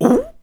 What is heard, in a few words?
Mouth Fx, Vocal